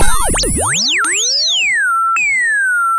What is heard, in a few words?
pop, sound of a bike bell